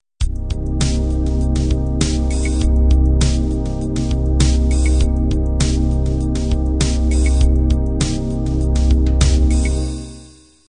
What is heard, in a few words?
groove ballad intro